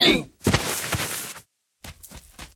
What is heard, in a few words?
Recoil; SFX 3D Azari Recoil